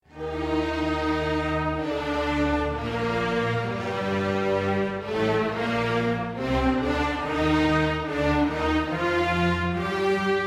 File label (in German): Orchester Georges Bizet Intermezzo; SBS